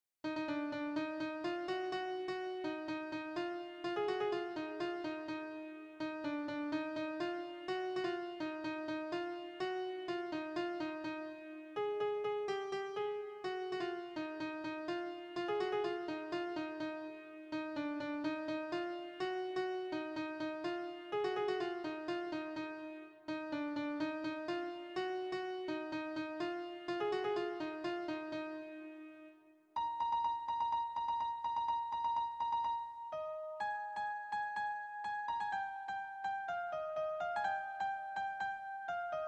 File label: melody, piano